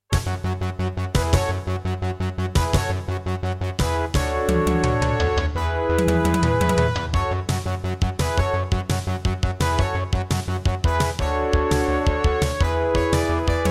Intro, Roland SC-88 MT32 Mode; 4D Sports Boxing

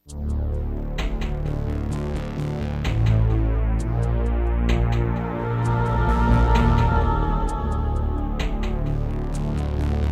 Serdaco DreamBlaster X1; Descent II

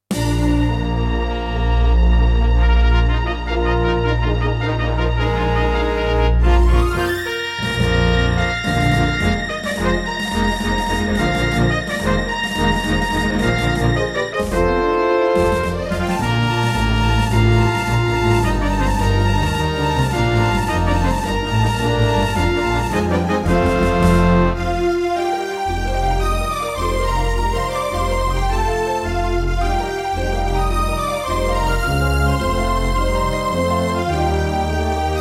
Intro, Yamaha DTXpress; Dark Forces

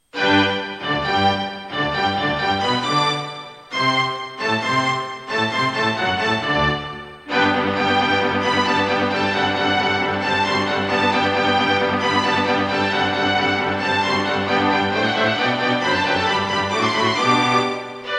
Wave Blaster II; Mozart - Eine Kleine Nachtmusik partial; classical

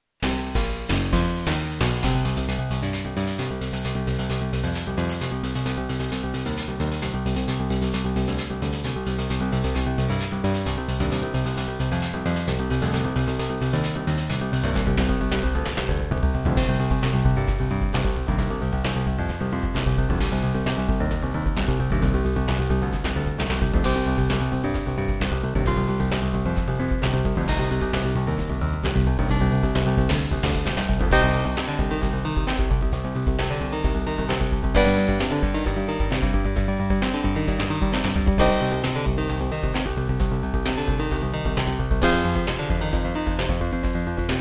Beepblaster 2.0 BEEPMODE; sigil doom episode 5

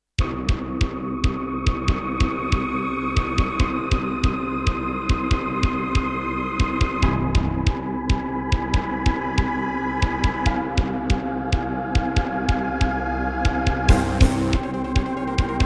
Korg Audio Gallery AG-10; sigil doom episode 5

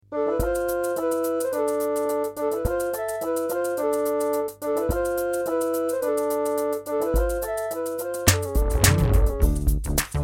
Creative Wave Blaster; Lost Vikings

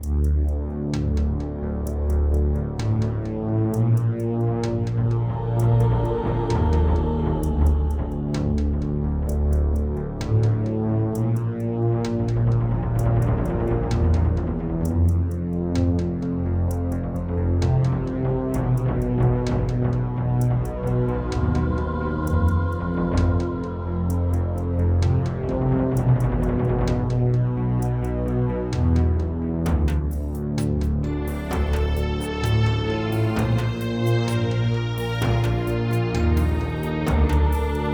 LG MIDI ART GS1000R; Descent II - Briefing

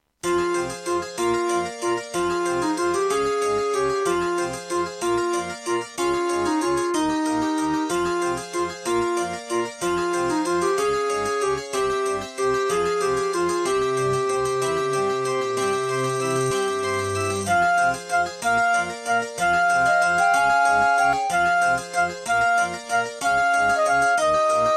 Serdaco Qwave; Death Gate

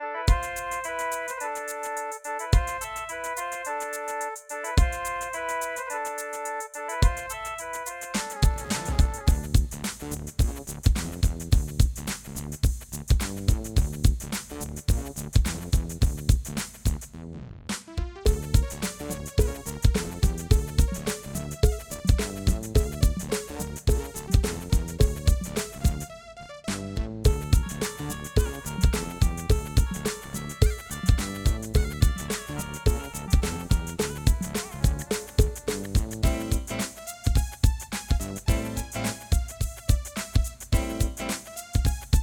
Casio LD-80; Lost Vikings

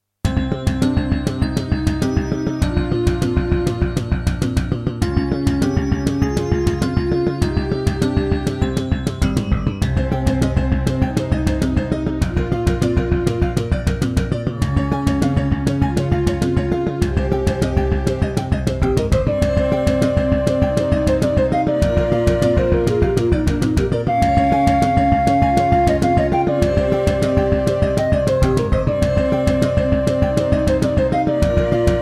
Yamaha DTXpress; Hocus Pocus

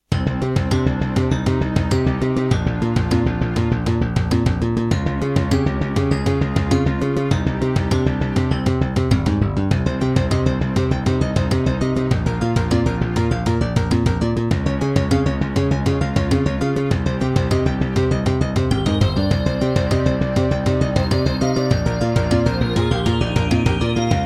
Serdaco Dreamblaster X2GS BURAN V1.1; Hocus Pocus

